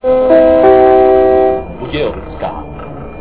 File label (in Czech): c prijezd, zvuky metro; Budějovická